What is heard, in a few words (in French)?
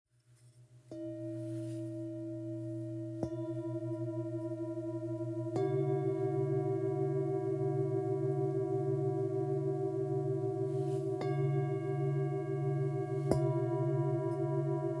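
Set, bols chantants tibétains; eclat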